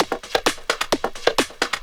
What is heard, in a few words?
drum 130, techno trance drumloops